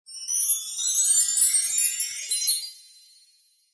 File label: fairy Dust Magic Sound Effect